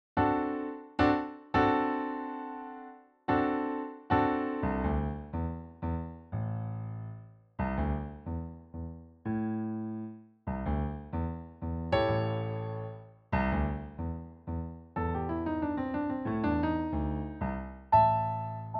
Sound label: Blues